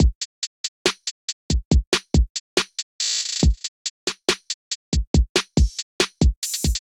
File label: Kit, Trap Drums; Venture Kit; Ableton; Factory Packs; Trap Drums by Sound Oracle